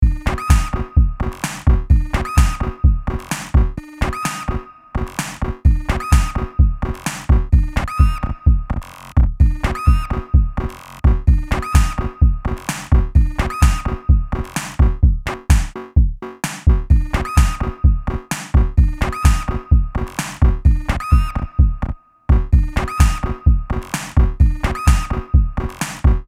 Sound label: Glitch